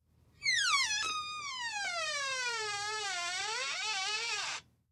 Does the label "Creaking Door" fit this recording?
Yes